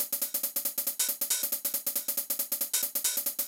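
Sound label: 16TH + open, Techno 138 BPM; Best Service ProSamples vol.54; AKAI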